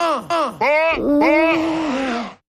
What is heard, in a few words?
R & B Vocal Variation